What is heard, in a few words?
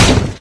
wood box impact hard; physics; source engine; left 4 dead 2